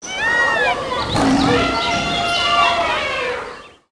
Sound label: zoo, buildings